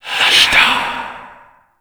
Fire Whispers; Grimoire

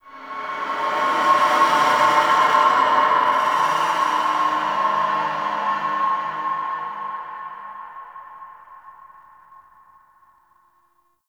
Ambient Texture; Warframe; The War Within

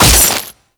Condemn Spectre Impact; Warframe; Priest

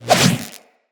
Electro Prod Whoosh; Weapons

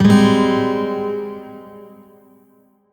Shawzin Phrygian Chord K; Music; Warframe; Lotus Shawzin

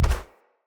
Step Default, Footsteps

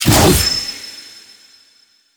Glaive Throw; Warframe; Teshin; Weapons

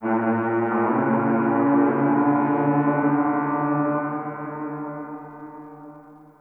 Venus Landscape Distant Horn High; Warframe; Venus Open World; Ambience

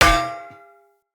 Polearm Medium Impact Block Defender; Weapon Impacts; Combat

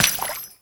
Steps Water, Footsteps; Enemies; Foley; Warframe; Grineer Royal Jester

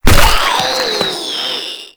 Inked Humanoid Death, Enemies; Warframe; Shadowgrapher

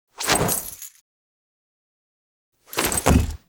Look Over Shoulder Idle; Lab; Lyon; Warframe; Tau; Protoframe Room; Ambience

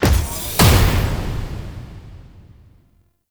Orbital Station Windows Close; Warframe; Tau

